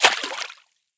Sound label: riffle drop puddle; STALKER; IWP